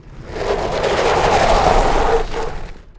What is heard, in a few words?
moto slide dirt; terrains; motox; Tony Hawk's Project 8; XBOX